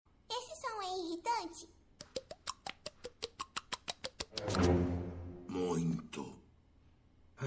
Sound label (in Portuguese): esse som e irritante